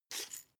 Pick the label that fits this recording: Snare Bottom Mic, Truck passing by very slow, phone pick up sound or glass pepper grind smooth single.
glass pepper grind smooth single